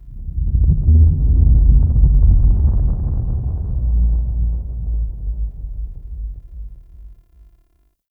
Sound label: Deep Earth Rumble; Warframe; Tau